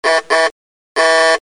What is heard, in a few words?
horn; Model T Ford